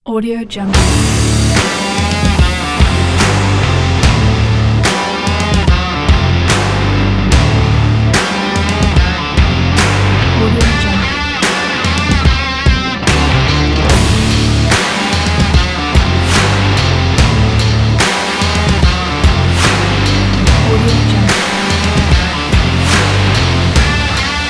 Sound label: sport rock